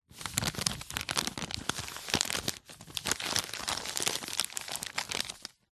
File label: paper wrapping gently handling